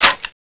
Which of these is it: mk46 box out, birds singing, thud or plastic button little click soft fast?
mk46 box out